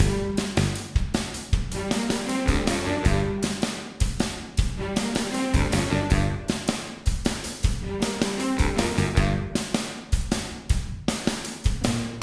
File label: Bee Hive Platforming MIXED; Ben 10; switch gamerip